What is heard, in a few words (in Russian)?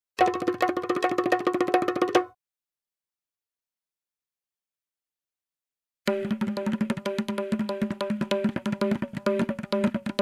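африканский барабанщик барабанит три набора ударных заканчивая короткой музыкой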